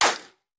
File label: Bullet Ricochet; Sound Ideas; Guns